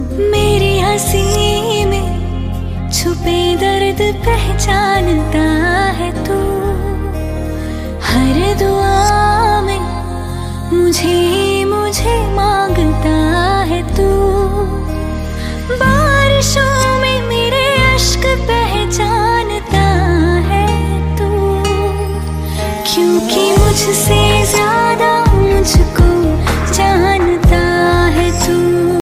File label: bollywood ringtone